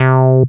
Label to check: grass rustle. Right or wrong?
Wrong